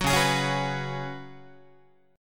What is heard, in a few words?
Db 7th Suspended 4th Db down Guitar; JGuitar